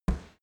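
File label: step soft stomping, footsteps; schritte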